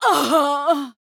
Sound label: Female Night Elf Death; Hearthstone; датамайн обновления 5.0